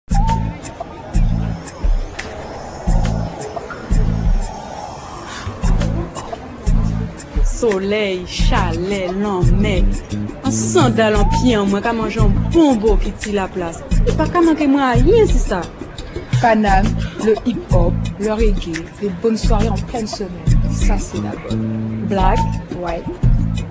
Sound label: jingle; franceo